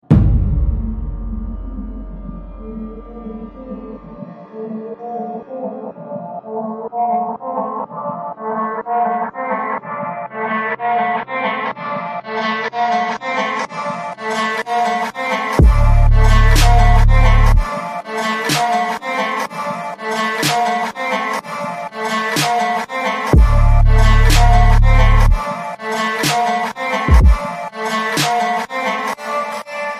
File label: Delay 808s